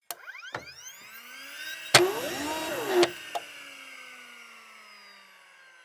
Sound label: machine office